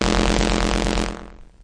distort of lupt

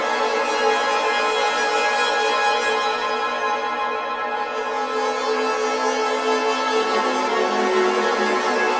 looping-verby-orchestral-mood, melodic loops

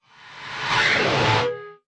fly by, Guitars

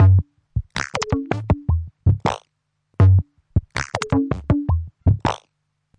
160 blurby funk synth bass, BASS LOOPS

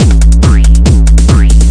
140 bpm, hardcore boinger, drum loops and breaks

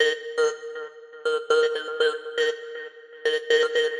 120 psytrance vocalish echo riff

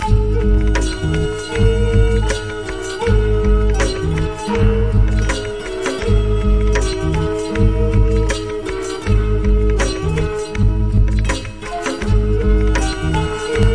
080 cool loop, sampled music loops; MELODIC LOOPS

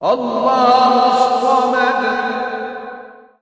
male prayer

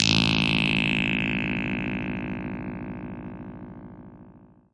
super narrowpass gongy synth

